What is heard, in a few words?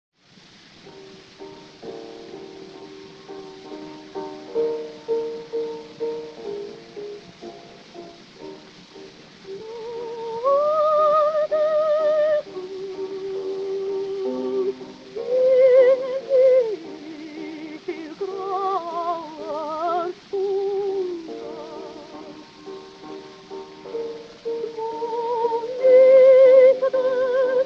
Gramophone